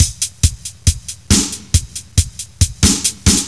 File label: drloop; Descent